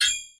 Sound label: timer; tetrio